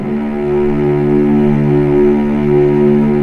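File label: cellos D2, String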